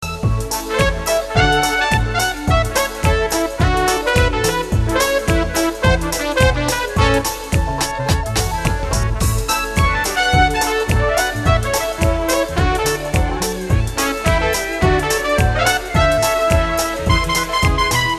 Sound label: Dixie Land